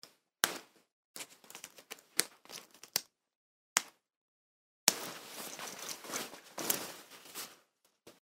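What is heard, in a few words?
zastejki knopki, snap fasteners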